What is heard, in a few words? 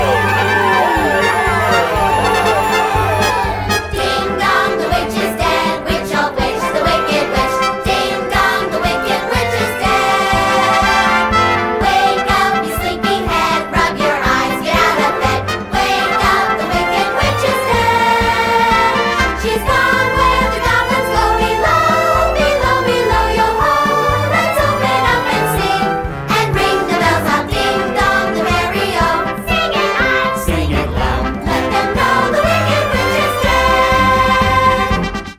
Ding Dong Audition Track Vocals; oz audition materials